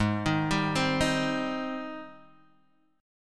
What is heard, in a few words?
Ab Major 7th, Guitar, Open G; down